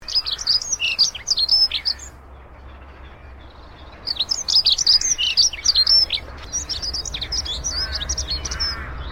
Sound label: Dunnock, birdsong